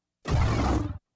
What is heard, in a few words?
synth roar growly ascend short bursts